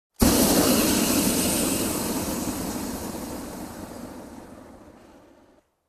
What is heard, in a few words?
Rocket being launched